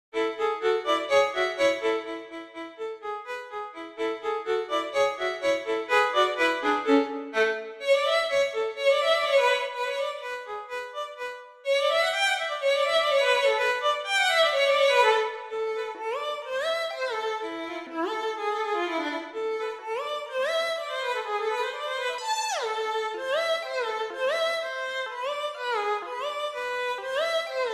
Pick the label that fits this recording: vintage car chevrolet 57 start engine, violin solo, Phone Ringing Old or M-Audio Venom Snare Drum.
violin solo